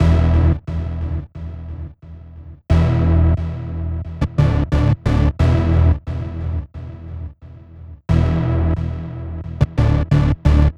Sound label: HipHop Synthbass Emin, Hip Hop Bass Synthbass loop; Digidesign; Pro Tools Essential Loops